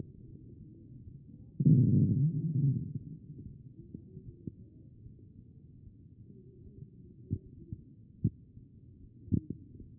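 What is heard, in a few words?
anterior chest, position 4; B MODE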